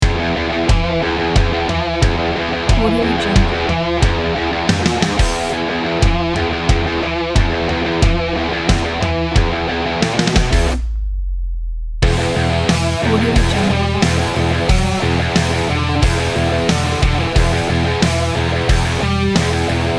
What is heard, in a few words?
massive hard rock; jam5studio